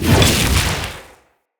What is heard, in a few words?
Fire Blade Throw; Characters; Enemy; Abilities; Sinecure; Banneret